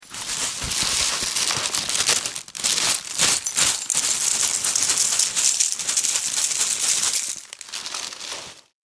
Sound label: Opening and tipping out breakfast cereal; Breakfast Flakes; Food (Sound Effects:Household)